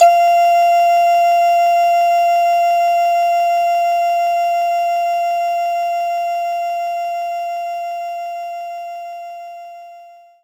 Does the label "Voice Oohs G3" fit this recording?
Yes